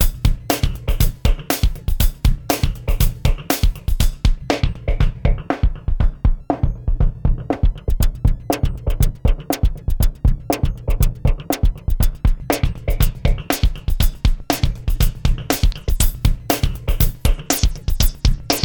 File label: drum processing; mopho